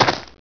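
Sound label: footsteps LEAVES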